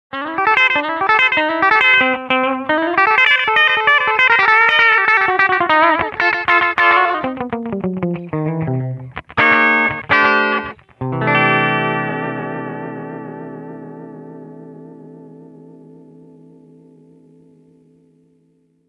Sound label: red black panel; Micro Cube red